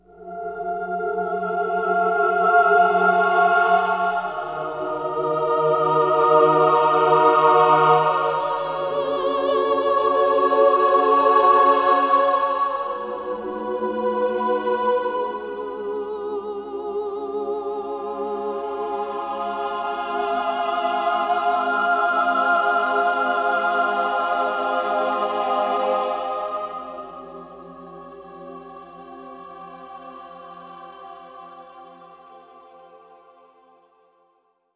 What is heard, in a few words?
Music Lose; Freedom Fighters